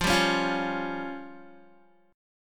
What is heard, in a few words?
F Suspended 2nd Sharp 5th, Guitar; Fsus2#5 strummed